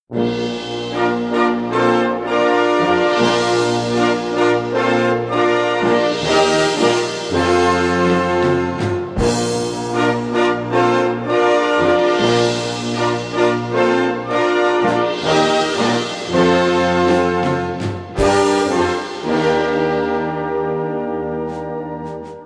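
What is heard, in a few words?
Introduction and modern Beat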